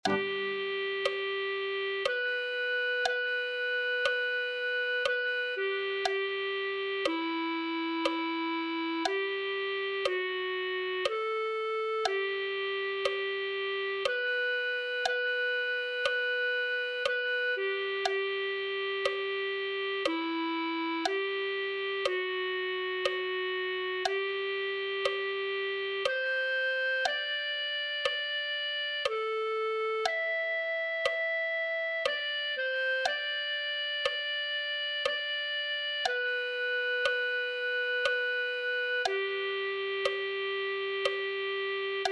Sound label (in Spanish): clarinet1